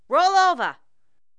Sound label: ROLL OVER